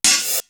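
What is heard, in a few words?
house hat, cymbal; East West; Global Sounds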